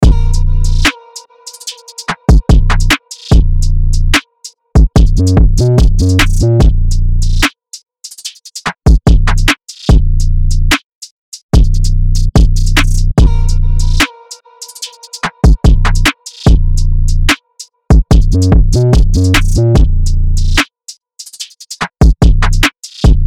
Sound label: Drum Loop 146 BPM Ebm; Unison Beatmaker Blueprint